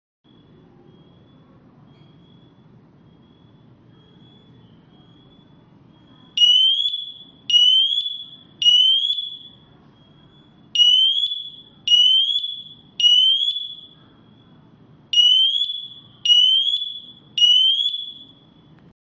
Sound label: Alarm-tone, rauchmelder; Ningbo Siterwell Electronics Co. Ltd.; GS546